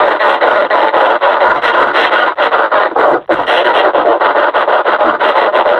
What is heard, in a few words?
breathing, combine suppressor